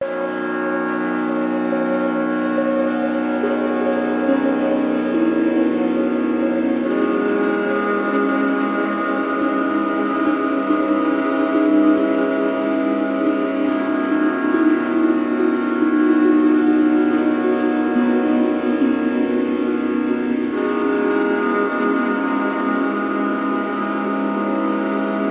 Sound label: Meditation Music Asmr Sleep Music